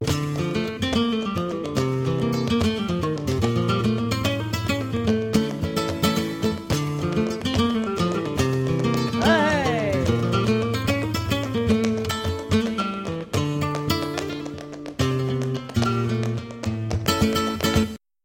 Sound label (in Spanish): buleria, flamenco